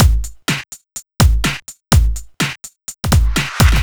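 No percussion, Drum Loops 125bpm, Nu Dance Electro; Breakin Riddim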